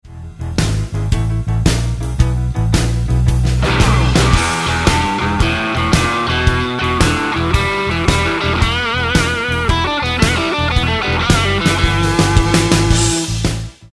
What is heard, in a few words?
Roadhouse Licks Lick, guitar; 20 Roadhouse Licks